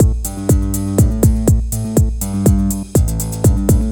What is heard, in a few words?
Guitar Hard House